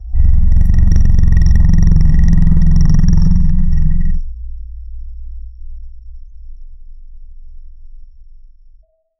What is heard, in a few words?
ancient prehistoric bird